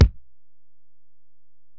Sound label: Ac BD; Alesis DM-PRO